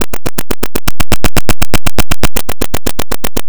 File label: Rhythm